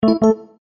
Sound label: notification, Sony Ericsson Z555i